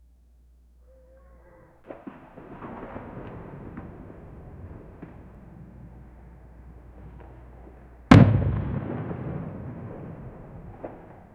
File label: outside the tent distant, conflict